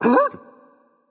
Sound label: surprised; cuchulainn